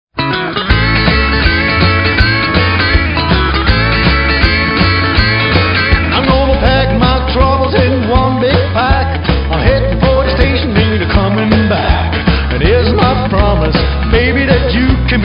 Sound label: blues train